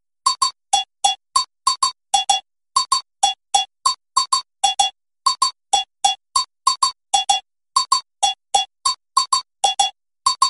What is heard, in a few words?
agogo